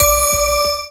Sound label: ICY TONE, Synth FX Misc